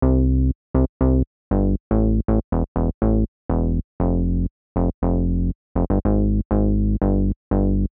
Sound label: Bass, 120bpm; Zero-G; Nu Disco; Nicengroovey C